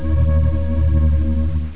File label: heal